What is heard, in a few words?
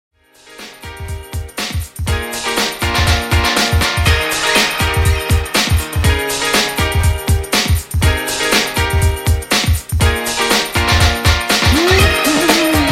80s Hip House, 121 Bpm